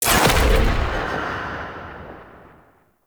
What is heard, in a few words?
Lightning Spawn; Enemies; Sentient; Sentient Movement